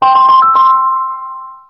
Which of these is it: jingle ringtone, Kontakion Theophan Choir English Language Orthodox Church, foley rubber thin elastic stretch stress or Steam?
jingle ringtone